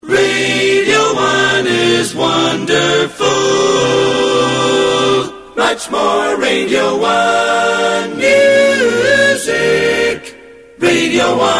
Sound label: acaps